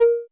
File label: Mid Pipe, Alesis D4